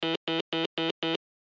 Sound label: Morse Code 2 Number; Blastwave